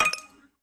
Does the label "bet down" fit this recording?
Yes